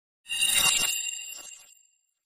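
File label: Hit Echoed Electric, hit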